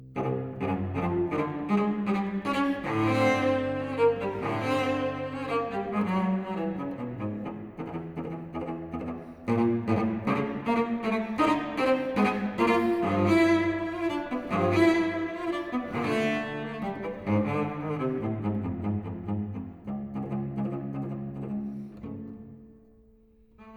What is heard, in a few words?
suite for solo cello marcia allegro; benjamin britten; no 3 op 87 1971